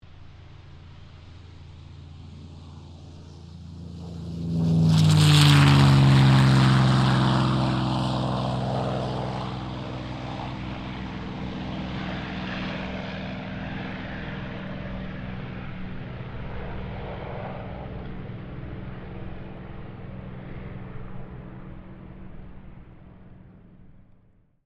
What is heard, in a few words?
AIRCRAFT CA-18 MUSTANG TAKEOFF; P51 /CAC-18 Mustang; Airplanes; Vehicles